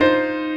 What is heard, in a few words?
piano